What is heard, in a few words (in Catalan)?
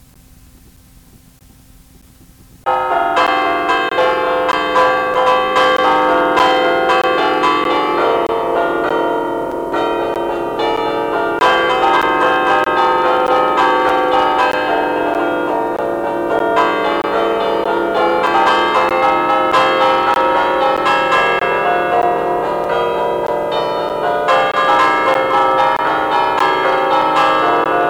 campana